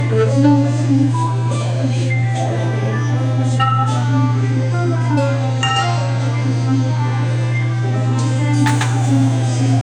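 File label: casual vibe for a supermarket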